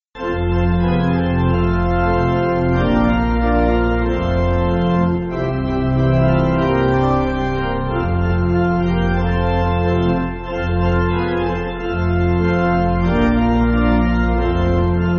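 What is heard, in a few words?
Pipe, Pipe Organ, Backing Files for Churches; 7/F; Deuwch Gyda Mi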